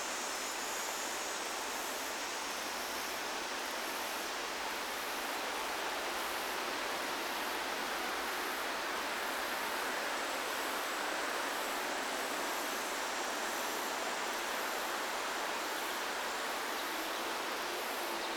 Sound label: ambience noon loop